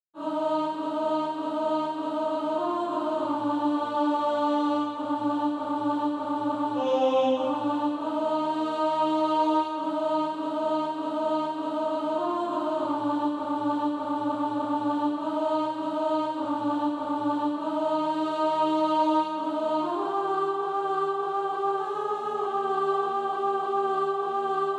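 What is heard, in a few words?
alto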